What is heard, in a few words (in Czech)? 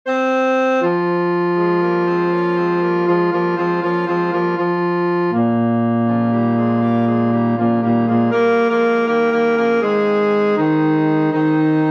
Bas